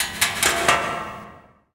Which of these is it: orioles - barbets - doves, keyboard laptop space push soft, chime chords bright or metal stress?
metal stress